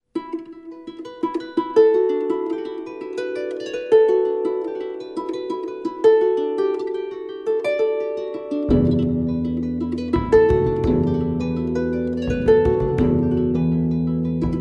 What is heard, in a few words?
Morrisons Jig